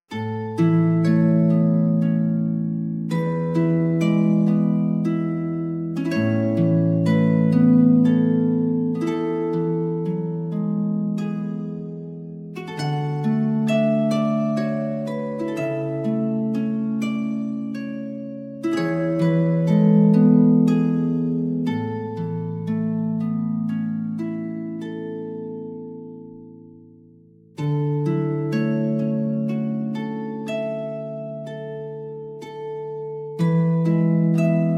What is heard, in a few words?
Harp; Dona Nobis Pacem Reimagined for Harp